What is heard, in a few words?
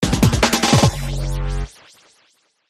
Dnb Sting